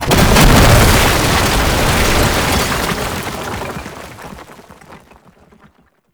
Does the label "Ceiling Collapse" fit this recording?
Yes